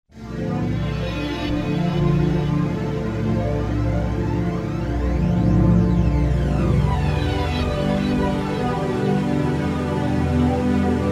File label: pad